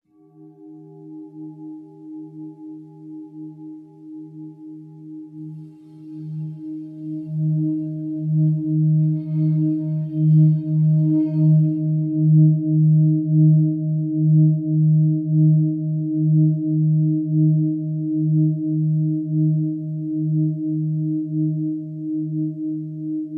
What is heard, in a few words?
Soul Point tone